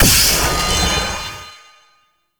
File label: Wave Ability Charge Up; Enemies; Abilities; Warframe; Duviri; Dax Knight